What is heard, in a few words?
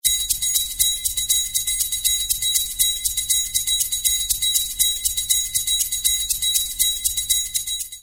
Percussion A shaker and triangle provide this interesting rhythm